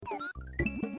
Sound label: nom; yoshi